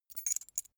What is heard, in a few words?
key ring small pick one